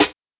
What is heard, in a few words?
original snare drum sound e key